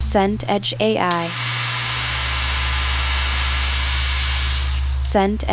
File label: fountain public